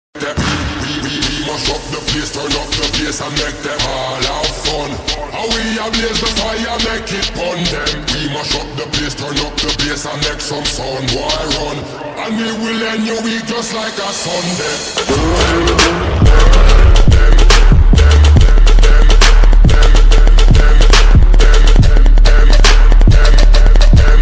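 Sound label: instrumental cool music ringtone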